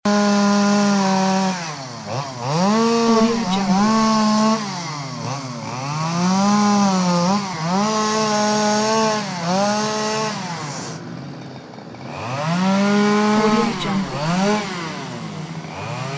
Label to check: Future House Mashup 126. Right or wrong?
Wrong